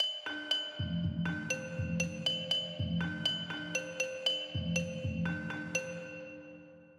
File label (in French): canon rythmique infini